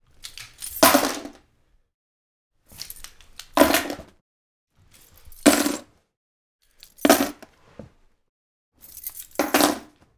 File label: Keys Drop On Wood Table Various